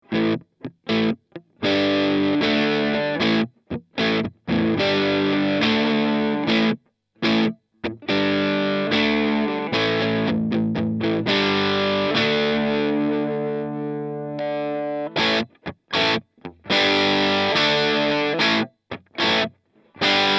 crunch LP

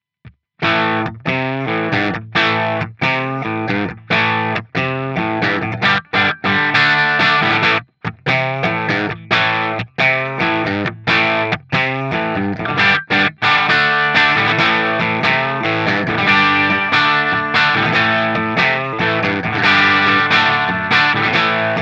Rhythm

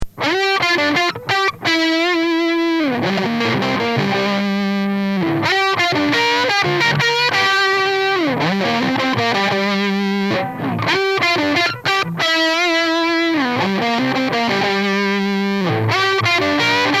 surfin drive; lampes; 12u7

